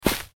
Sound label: footstep grass